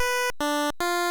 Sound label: Unused Jingle; Tetris DX